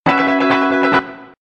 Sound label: Funky And Nice